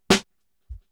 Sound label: SNR, Snares